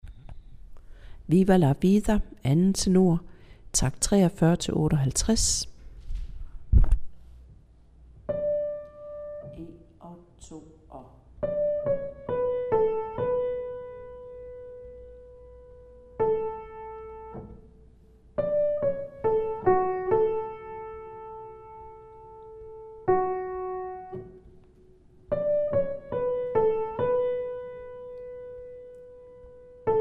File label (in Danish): 2. tenor